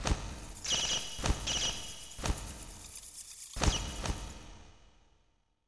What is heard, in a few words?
Bat Idle